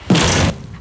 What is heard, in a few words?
Explosion; SemesterGameJam2022